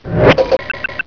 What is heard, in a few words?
button; svencoop; cracklife; buttons